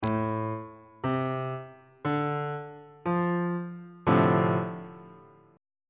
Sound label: d minor 7th 2nd inversion on bass clef